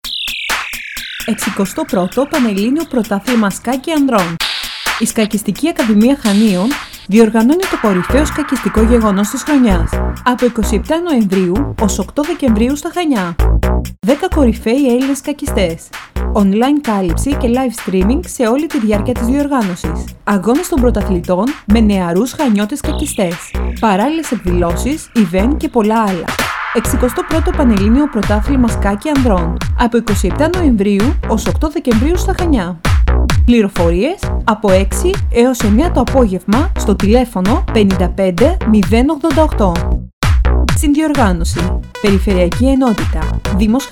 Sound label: sax